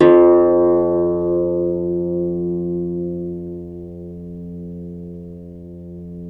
CEL HARP F1; USB Soundscan vol.23; Historical Instruments; AKAI; CEL.HARP1

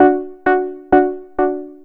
KEY, 130, 2 Step; USB Soundscan vol.51; House Side Of 2 Step; AKAI

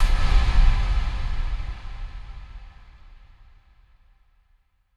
fx impact, Noise

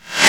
METAL FLANGE; AKAI S-Series CD-ROM Sound Library VOL-1

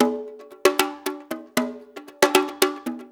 6-8 Conga Brush, Perc Loops

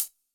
HHCL, Yamaha SY85 Drums

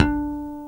BS, E.Bass FX

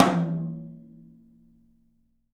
TOM, Studio Toms; 3D Percussives

